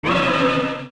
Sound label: King Dodongo Exhale